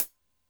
Cym Jazzed, Drum Machines Alesis D4

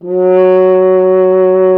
F. Horn; Miroslav Vitous; Solo Instruments VOL-2

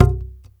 WD, DRM HITS; Spectrasonics; Liquid Grooves

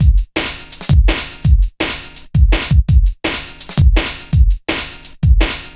Metallic Rolla, Drum Loops 166.5; Zero-G Jungle Warfare 1, 2 & 3

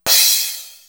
CRASH, DPM-48 Drums

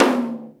OIL PITCH, PERCUSSION

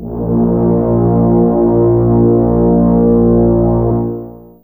Horn ensm C3, Fairlight CMI IIe; Brass1

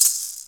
MARACAS; Univers Sons; Basicussions

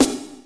SNR, DRUM ZONE; Masterbits - Total Zone

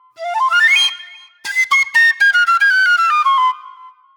Disco Flute

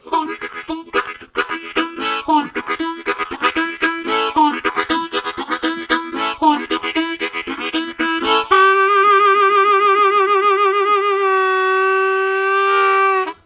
Chug Vibe, Harmonica Riffs; Zero G - Harmonica

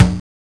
Conga, Genesis; M8

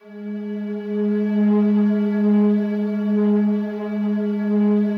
SLOWSTRINGS, G#2; Yamaha VP-1; Music Store; 90's Sample CDs

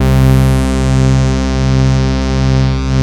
DW8 BRS C2, Korg DW8000; Back In Time Records; Korg Universe VOL-1

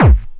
BD, Dance Mega Drums, BD HOU; Best Service

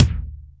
Kick, Akai MPC-500; Toolkits; Kick TKit

